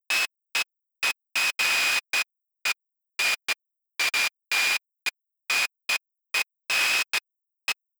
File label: synth noise loop